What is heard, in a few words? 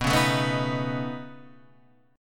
Db 7th Suspended 2nd Sharp 5th